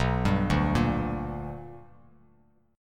B Minor Major 7th Sharp 5th Bass Standard; arpeggiated; JGuitar

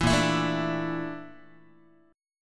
Bb Major 7th Flat 5th Bb Guitar; BbM7b5 strummed; JGuitar

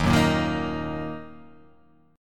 D sharp Minor D sharp; 11,9,8,8,10,10,x,x; chordshape

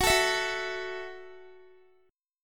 Gb Minor 7th, Guitar; Gbm7; strummed; x,21,21,21,18,x

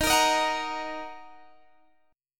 D sharp Minor, Guitar, Drop D; x,18,20,20,19,18; chordshape

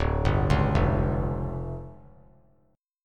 D Minor Major 7th Double Flat 5th, D; DmM7bb5; arpeggiated; JGuitar

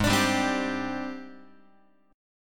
G sharp Major 7th Flat 5th G sharp, down, Guitar; strummed; x,4,5,5,4,6; 4A,4E,5A,5D,5G#,6C#; G#M7b5

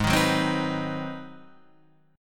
G sharp 7th Sharp 9th, Guitar, Half Step Down

